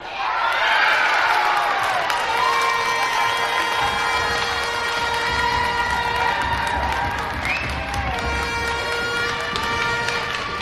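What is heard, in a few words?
Crowd Cheer Applause; medium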